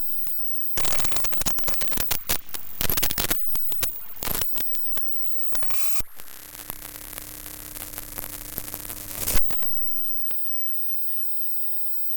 glitched tones Interference

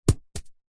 leisure hacky sack footbag land carpet

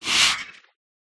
futuristic door slide; the sound pack tree

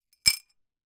foley broken dish piece down on other; zapsplat

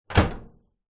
household microwave oven door open metal; zapsplat

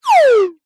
cartoon descend whistle air; zapsplat

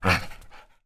animals dog med playful growl energetic

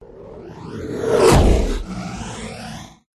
whoosh; Audio Mangler

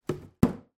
household nappy bin lid close

